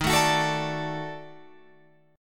D sharp Minor Major 7th Flat 5th D sharp down Guitar Open F; strummed; chord